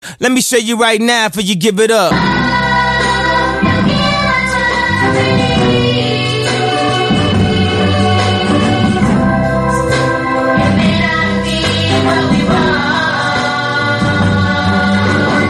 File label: choir